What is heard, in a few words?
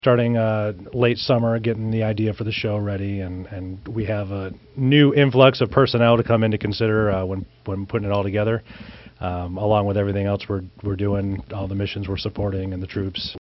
Brass; 1st infantry division band; holiday concerts